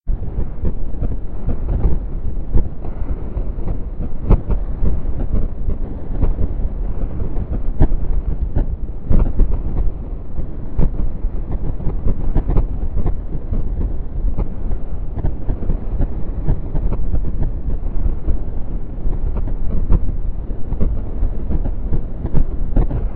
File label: fault amb; Five Nights at Treasure Island 2020 Remake